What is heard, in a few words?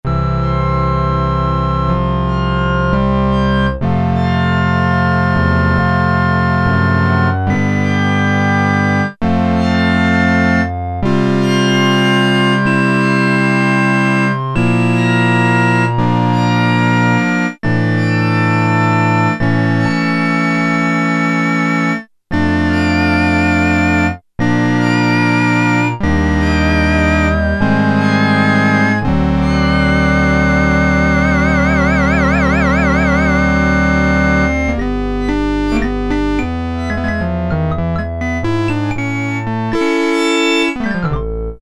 Delayed Harmonic; Sequential Circuits Prophet-5 (Ver.3); Factory Patch